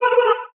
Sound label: vocal underwater, Am; SD AURORA Sample Pack; Slate